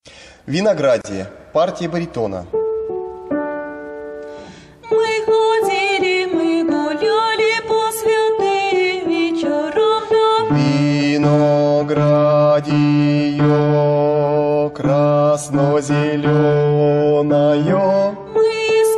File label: baritone; Vinogradie